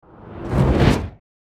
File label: transition two step; Alexander Kopeikin; Transitions HD; GDC Game Audio Bundle